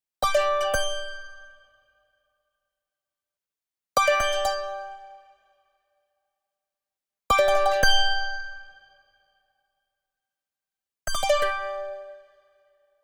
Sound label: Arcade Jingle Positive